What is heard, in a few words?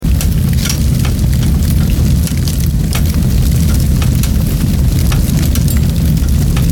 Fire loop; FoS SFX